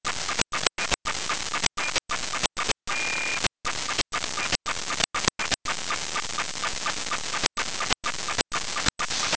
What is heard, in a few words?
423863 khz fm